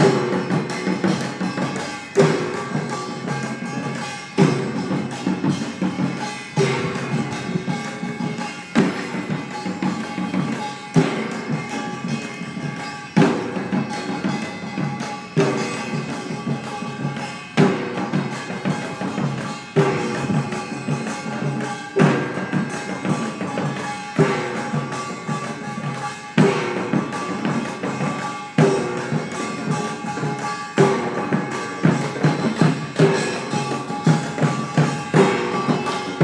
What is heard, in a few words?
Hangang park Music Seoul Korea